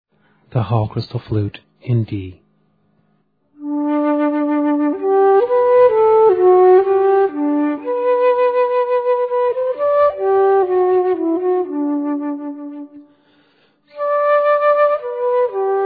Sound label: hall crystal flute in d